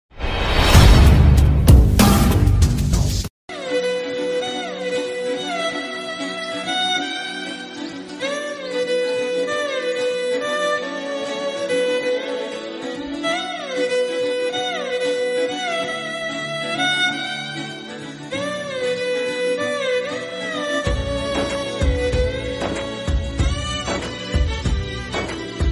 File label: flute ringtone